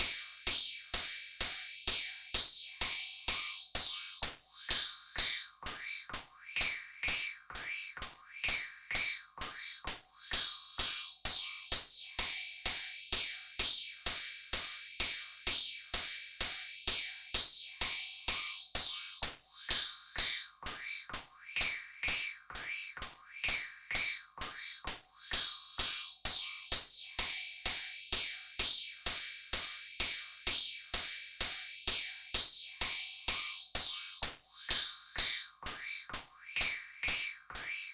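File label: Balearic Progressive Trance Percussion 128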